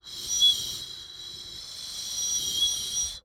Bird whistle, clay, painted, dry, airy, wispy, phrase, rise, sustain light, waver, surge, soft; Eiravaein Works; Vaeyan IV